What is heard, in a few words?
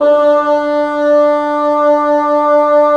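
wind bassoon; LA Composer vol. 3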